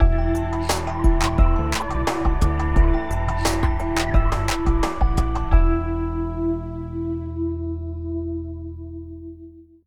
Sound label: opening animation music